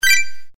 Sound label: enter